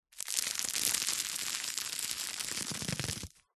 office parcel tape strip stuck to carpet finger rub along